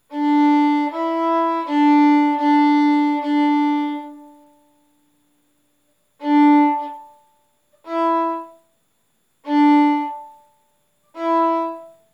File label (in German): Geige arco